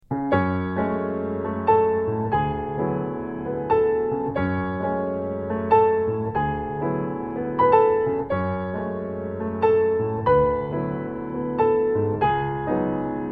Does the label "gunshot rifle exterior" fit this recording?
No